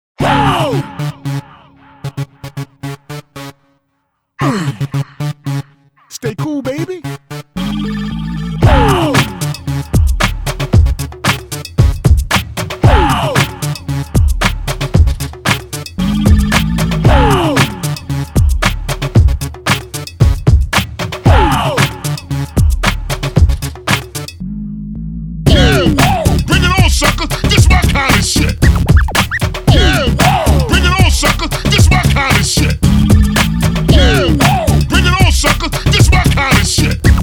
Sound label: Rap